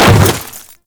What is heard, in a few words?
Land Ice, Footsteps; Enemies; Warframe; Grineer Hammer Boss